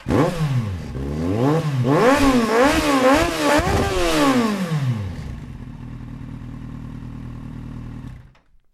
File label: motorcycle start repeatedly; salamisound